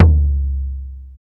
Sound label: long tom single hit c sharp key; Duration 0:01